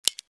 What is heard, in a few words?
click